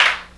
prominent snare drum E key, snare drum sound tuned to the E note